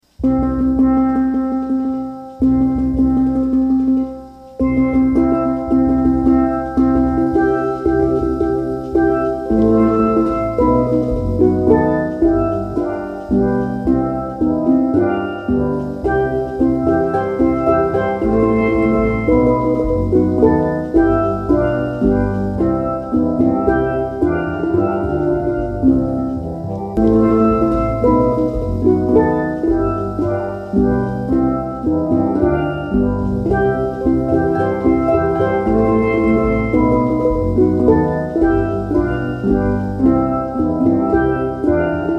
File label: recessional traditional, steeldrumband